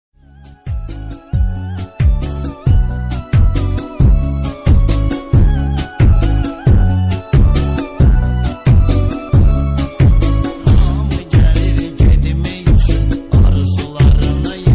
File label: azeri bass music